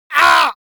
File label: human vocal male painful cry; گریه مرد از شدت درد; human sounds